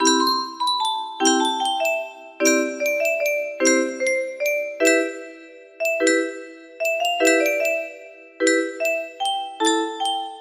lullaby, music box

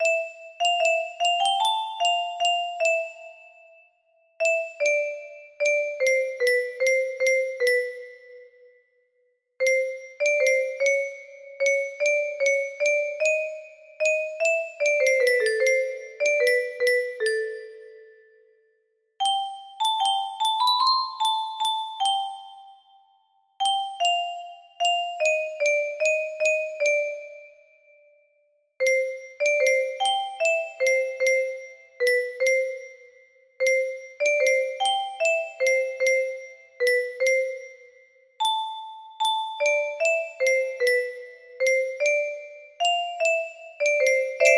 melody, music box melody; devils trill

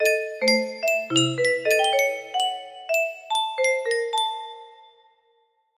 music box

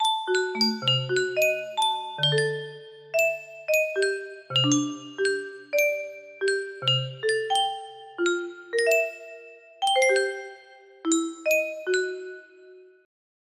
music box

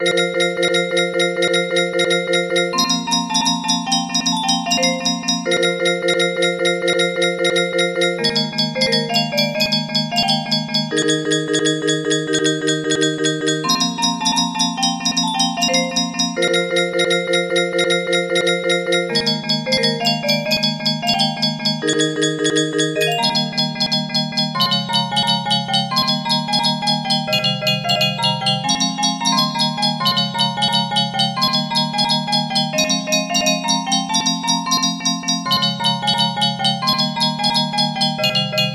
piano

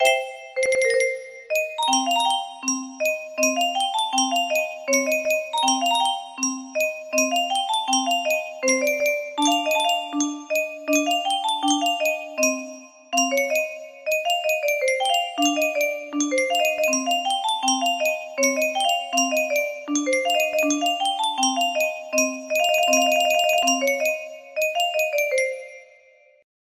arabic ringtone, music box; nokia

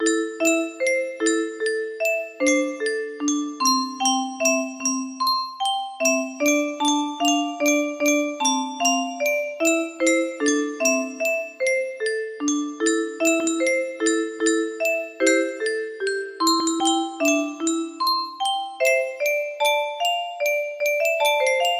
music box

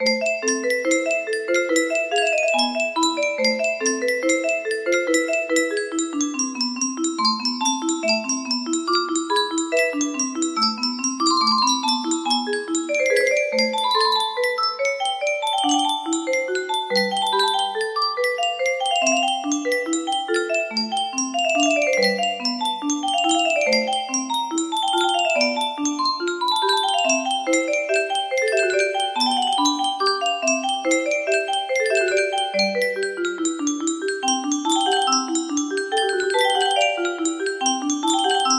prelude in b minor, music box; j s bach; bwv 893

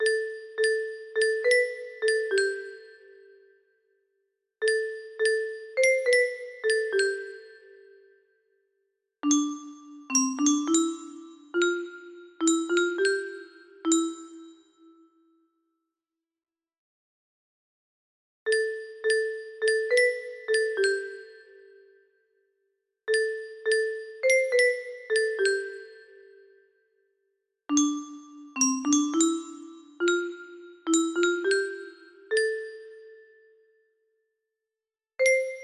dulcimer

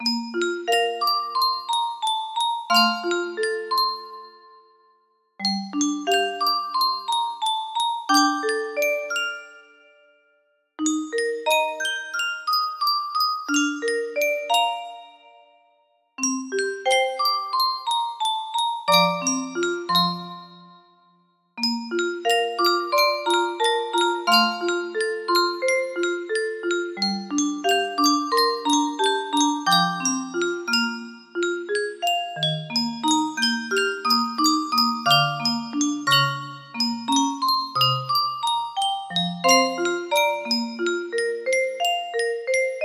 piano

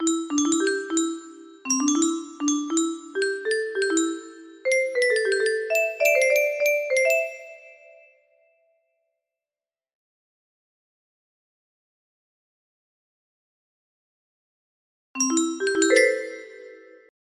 music box; radio suara surabaya jingle

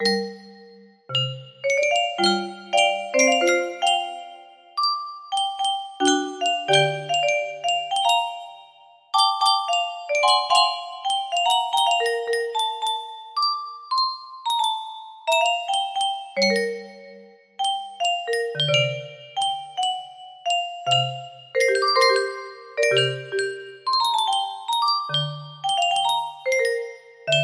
melody, music box melody

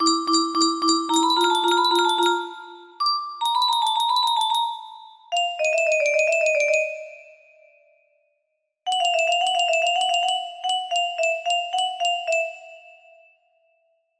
haunted, music box

